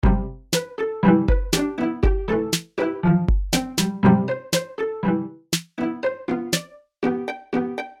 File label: Jingle; Retorio